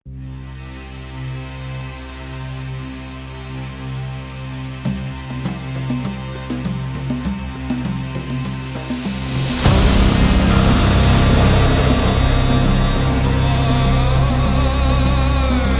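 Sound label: Arabia, epic cinematic trailer music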